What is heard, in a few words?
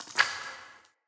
Cave Ocean Rock Crumble